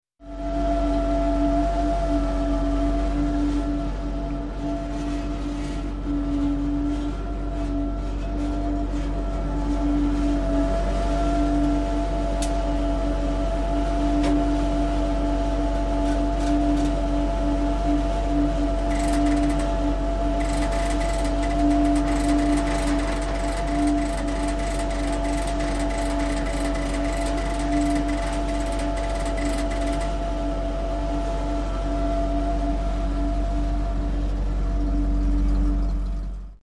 Motor 3; Running Steady. Same Motor As FX 48 With Metal Rattles And Ratchet-like Metal Movement At Tail.